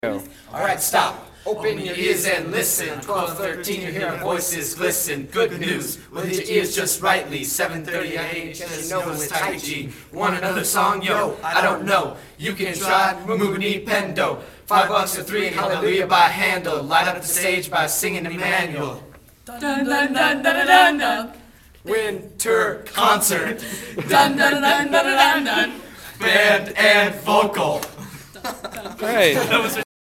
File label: Jingles